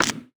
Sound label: Wooden Thin Objects Sticks Rattle